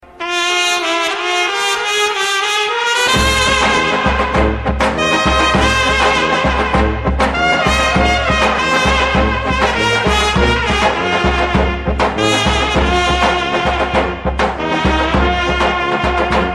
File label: Truba